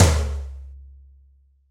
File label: Room Tom 1, Roland BK-5 Toms